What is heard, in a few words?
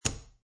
Poker Chips Place One Chip Onto Stack Of Chips Wood Table; Gambling Sound Effects